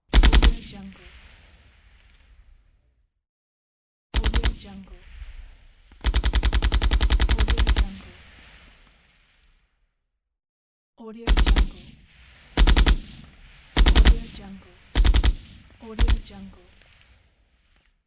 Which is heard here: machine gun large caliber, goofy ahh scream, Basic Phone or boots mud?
machine gun large caliber